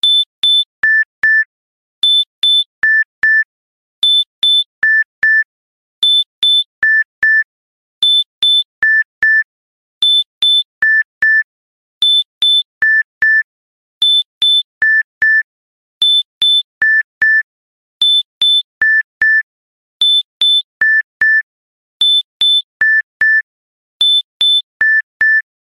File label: Step Beep; LG SU880 Optimus EX; ringtones